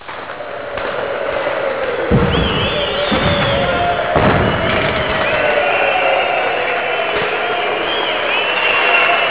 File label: cheers